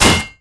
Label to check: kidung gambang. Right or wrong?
Wrong